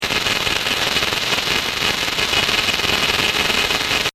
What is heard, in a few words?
gears